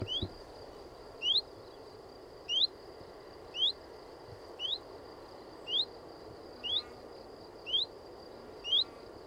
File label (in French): pouillot véloce cri